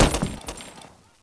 impact, explosive; garrysmod

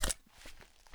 m9 mag out, beretta92fs pistol; garrysmod

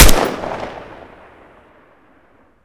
rpk; garrysmod

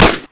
alyx gun fire; weapons; garrysmod

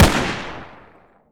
sako fire; sako92; garrysmod

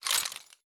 vollmer belt remove; mg; garrysmod

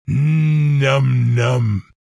nomnom; cstrike; war3source

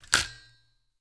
bipod up, weapons accessories; harris; garrysmod

